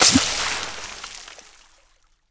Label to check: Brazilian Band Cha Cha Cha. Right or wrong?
Wrong